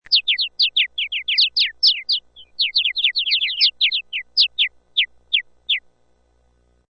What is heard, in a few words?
Birds Chirping, Multiple birds chirping.; Animals